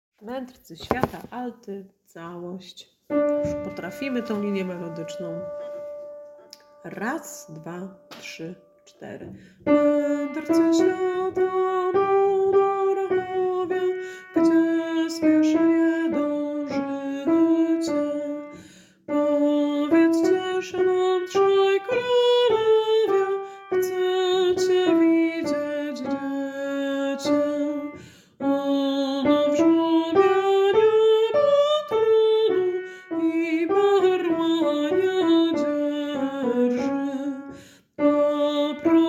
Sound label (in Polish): Alt calosc; Medrcy swiata; Boze Narodzenie